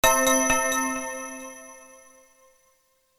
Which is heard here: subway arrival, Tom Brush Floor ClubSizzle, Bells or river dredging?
Bells